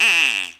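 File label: WHISTLE, LATIN PERCUSSION; Best Service Advanced Media Trax Modern Composer; LATIN PC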